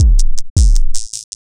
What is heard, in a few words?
Beat, Drums & Percussion; Electrocution; Beats